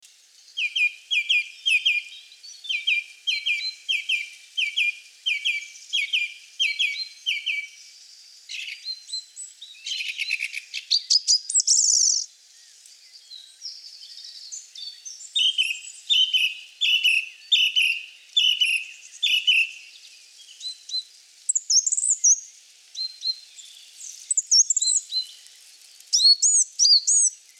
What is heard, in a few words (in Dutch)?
vogels in het oranjebosch